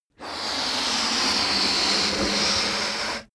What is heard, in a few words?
balloon blow long